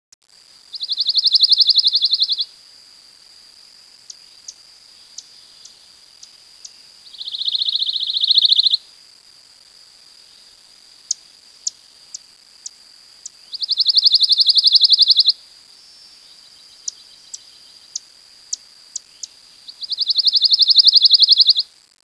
pine warbler, songs

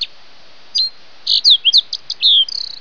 fox sparrow

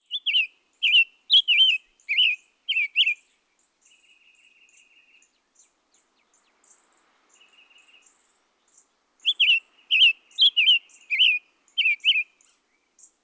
summer tanager, songs

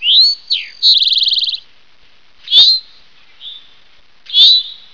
towhee, songs

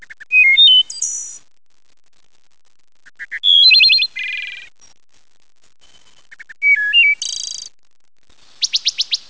wood thrush, songs

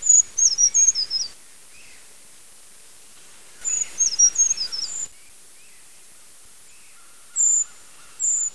brown creeper, songs

